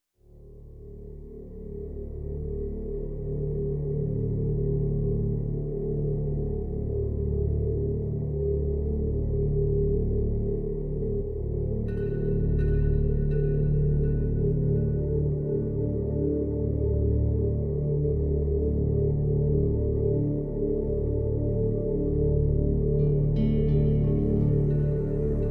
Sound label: D minor, No Guitar